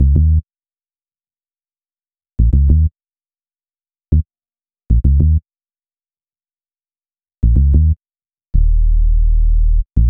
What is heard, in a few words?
Bass, Hip-Hop